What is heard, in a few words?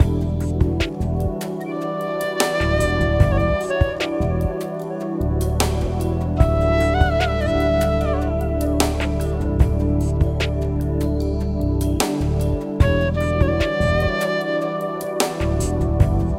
Flute fantasy, ringtones; Micromax Andro-A60